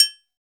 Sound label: Triangle Mute, Percussion; Roland BK-5